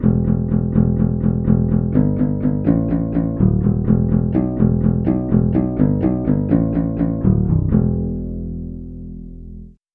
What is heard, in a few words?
banjo bass